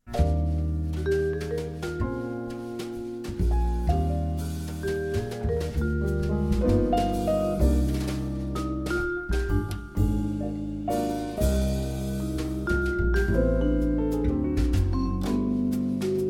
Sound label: quartet